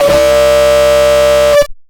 free pipe kick

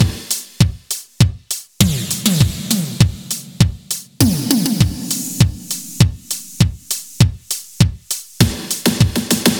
100 Beat Street Beat; Zero-G; Future Wave

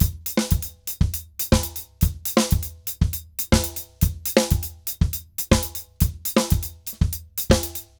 Drums Samba 120 bpm; Best Service; Festa Latina VOL-1

